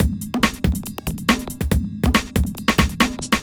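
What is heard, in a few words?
industrial 140 kit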